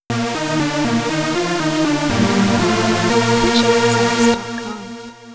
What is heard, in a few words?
Synthwave Cheer